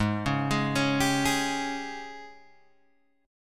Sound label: Ab Major 7th Suspended 4th Ab down Guitar Open G